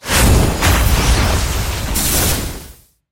主动技能释放音效; nba; 战中

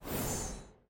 球员上阵音效; nba

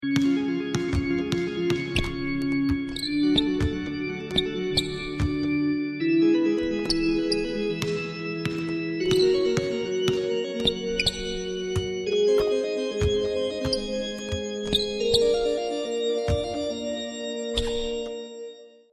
风琴上行; 战中; 战斗音效; nba

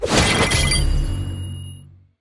战斗失败音效; 战中; nba

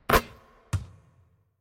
投中; 战中; nba

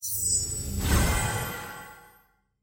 金卡出现; nba; 球探系统

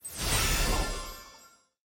球员升级上方动画; nba

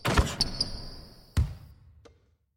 灌篮; 战中; nba

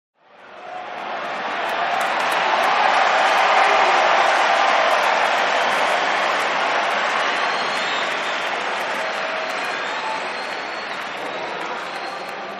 最大的欢呼; 战中; 战斗音效; nba

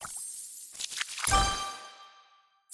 主线关卡挂机奖励飞到背包; nba

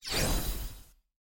拍卖行页面内的小卡出现; nba

